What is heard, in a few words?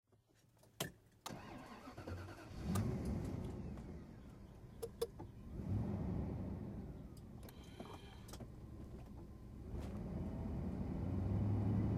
rolls royce silver spirit driving